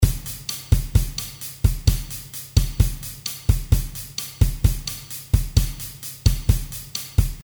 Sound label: Bossa Nova Drum Pattern Sound, bpm130